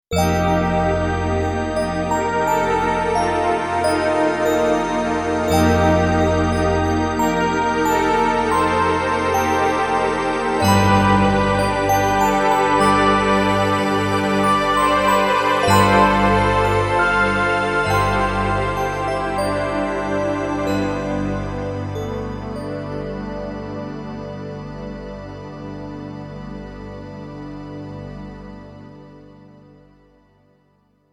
Bell Pad

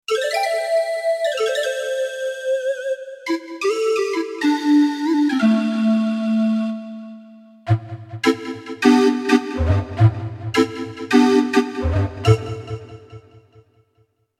Glass Flute

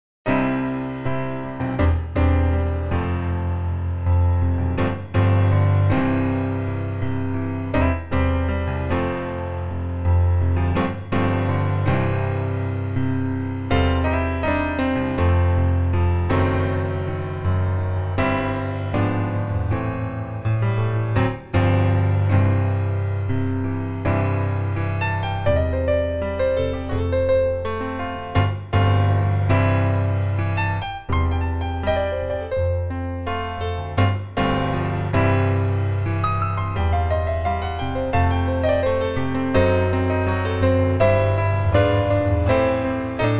Venice Grand C5

